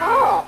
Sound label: hurt sound; omega flowey